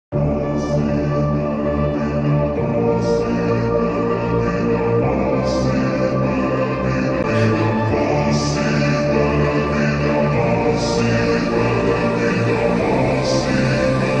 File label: remix